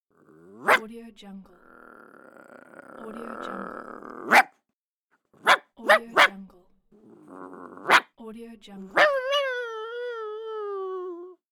angry dog barks

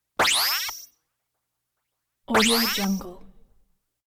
cheesy power on boings multiple variations

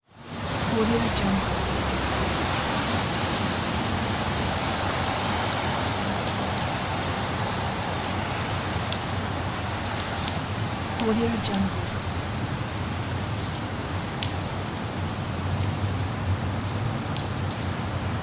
city rain

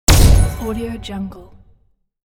laser shot

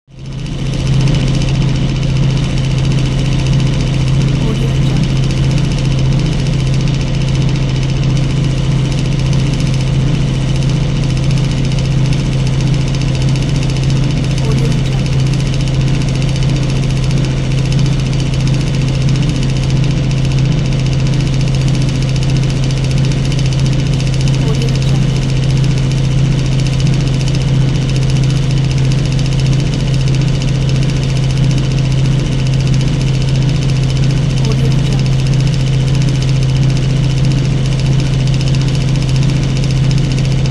servo air compressor with bassy clatter

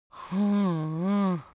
cartoon vocal exaggerate disappointed boy

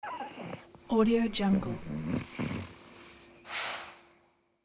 pug dog yawn

